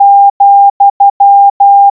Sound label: oubun syoutokuten, morse